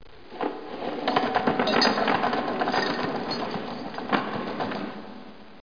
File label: chains; Broderbund Print Shop Multimedia Organizer Deluxe Edition